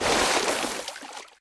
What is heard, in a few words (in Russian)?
shum utrennego priboya